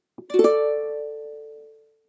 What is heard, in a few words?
d a shape, ukulele